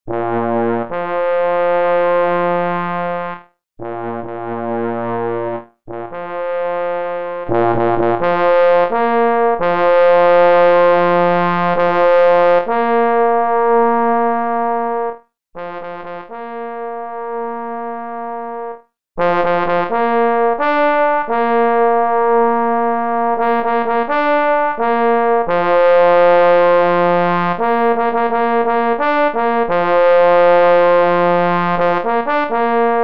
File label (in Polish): Hejnal parf; K.J. Szpetkowski; Hejnal Malopolskiego Konkursu Sygnalistow Mysliwskich O Rog Zbramira; parfors solo; Niepolomicach